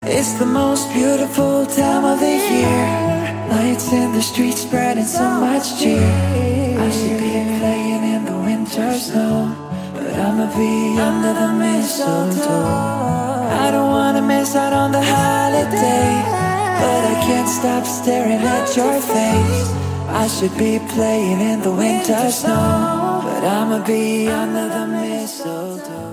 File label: Acoustic